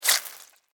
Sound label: Insect Spider Bite; Spiders; Animals:Insects; SFX Bible 6